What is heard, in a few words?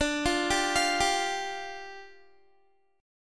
D Minor Double Flat 5th, down, Guitar; Dmbb5; arpeggiated